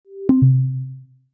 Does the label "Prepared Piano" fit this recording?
No